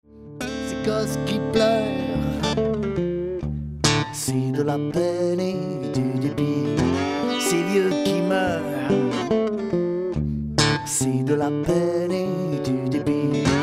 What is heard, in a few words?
Gaulois blues